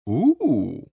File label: Human Male Ooh